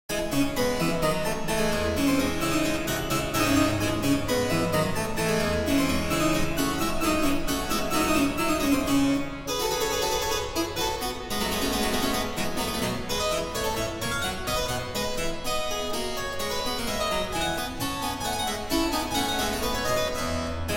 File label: Praeludium & Fuga, Ouveture burlesque in D minor; Georg Philipp Telemann